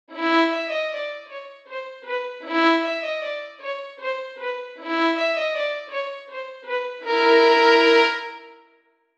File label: Violin Part Scary